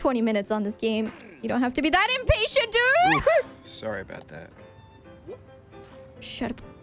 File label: Scream You don't have to be this IMPATIENT; IRyScream